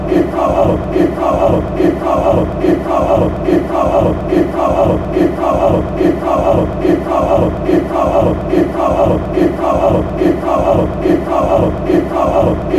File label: stupid child, fanchants